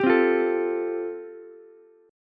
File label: A Minor 7th Sharp 5th A, Ukulele; 12,12,13,12; Am7#5 strummed; JGuitar